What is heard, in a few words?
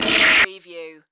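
Production Element Distortion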